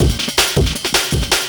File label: Mashed Beat, Drum N Bass; Sonic Foundry (Sony Creative Software); Knutrix Drum & Bass